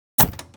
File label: Harvester Call Button In; moul-assets